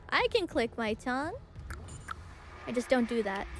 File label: clicking her tongue